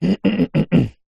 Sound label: human man clear throat